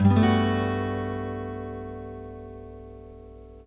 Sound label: Acoustic Guitar Chord - E Min; Instruments; Guitars; Cymatics; Light Free Sample Pack; Bonus Samples